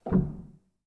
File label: rel, Grand; bitKlavier; Binaural